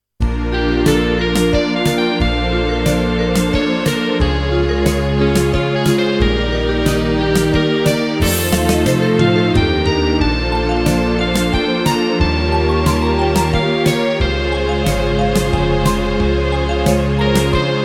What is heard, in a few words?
waltz